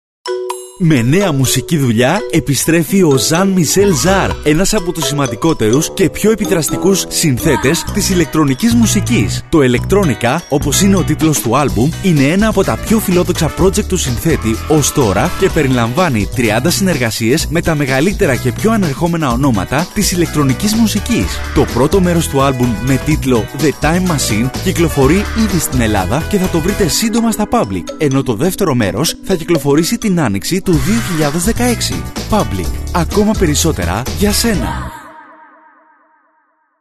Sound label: electronica